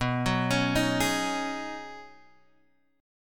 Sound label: B 6th Add 9th B down Guitar Open G